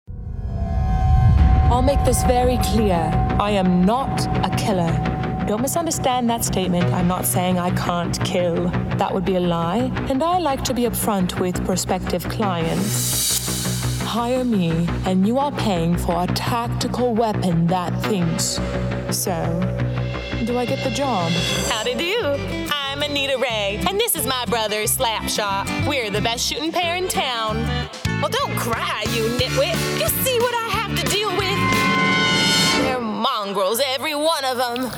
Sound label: Video Game